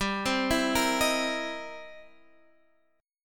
C 7th Sharp 9th G

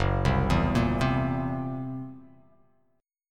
Gb 7th Flat 5th Gb down; arpeggiated; JGuitar

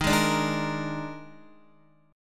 Eb Major 7th Suspended 4th, Guitar; strummed; EbM7sus4

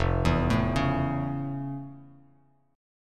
F Augmented Major 7th, Bass Standard; arpeggiated; 1,7,7,6; down

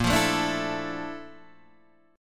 Bb Major Sharp 11th Bb down Guitar Open D, chord

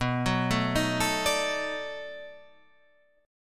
B 7th Sharp 9th, down, Guitar, Half Step Down

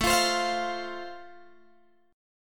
A Minor Sharp 5th A Guitar DADGAD, chord

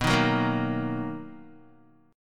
B Major 7th B down Guitar; strummed; JGuitar

